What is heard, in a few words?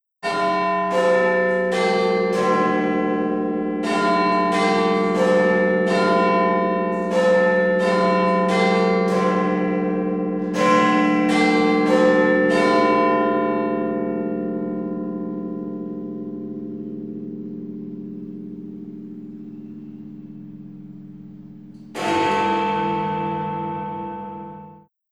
Cities lon Big ben